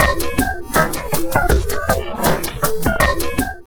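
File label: drum loops crazy processed, 80 bpm; USB Soundscan vol.07; AKAI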